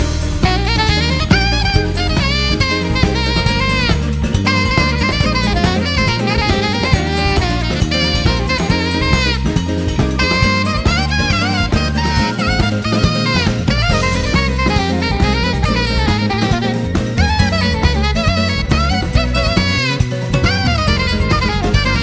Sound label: solo; MK8